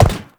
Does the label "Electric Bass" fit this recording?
No